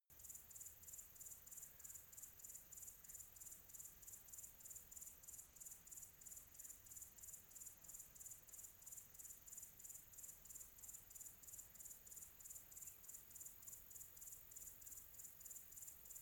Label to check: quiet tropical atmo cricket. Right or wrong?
Right